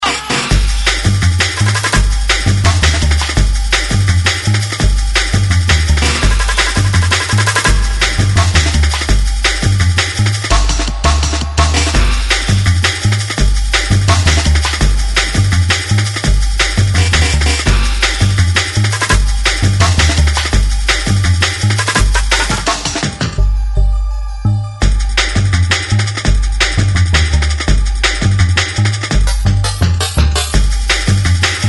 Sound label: Latin Flute